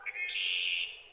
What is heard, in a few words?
distant bird; WAR